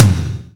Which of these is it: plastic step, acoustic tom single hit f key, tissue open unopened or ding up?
acoustic tom single hit f key